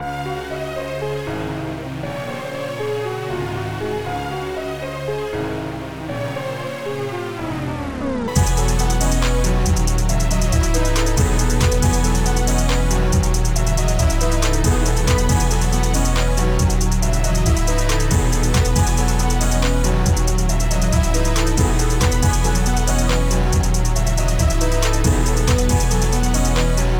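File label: orchestral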